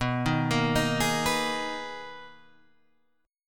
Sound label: B Major 13th B Guitar Open G